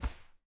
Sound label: crouch slide